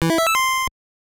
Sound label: Get Item - Heart; nuts milk; nes